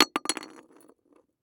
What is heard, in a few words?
Test Tube Drop; Medical; Blastwave